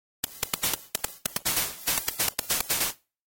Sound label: Stage Clear; Arrow Flash; Genesis